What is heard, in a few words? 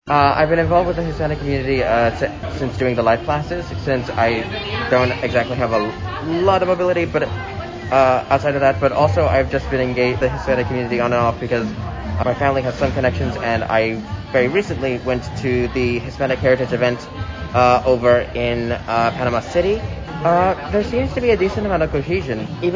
Beat; Gulf Coast State College Celebrates National Hispanic Heritage Month; Commodore Waves